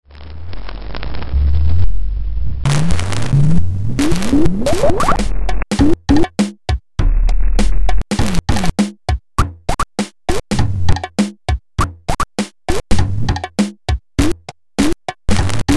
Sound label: beat